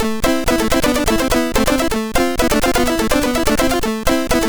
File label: Crazy Intro, MOS6581R4